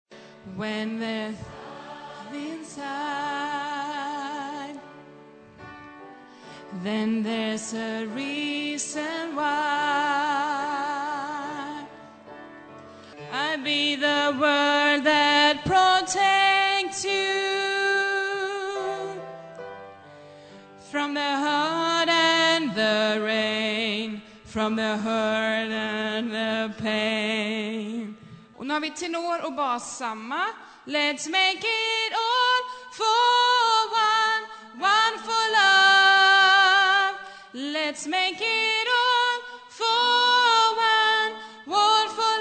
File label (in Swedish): bas